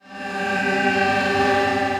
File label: Violin, distressed strings; Instruments; Dante's Violin; Ableton; Mood Reel